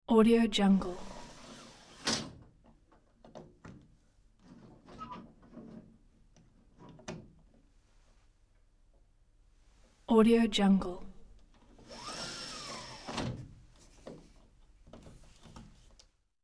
venetian blinds; sound ideas

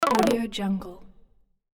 arp down sine clicks

